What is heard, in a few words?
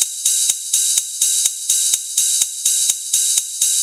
Top Loop 125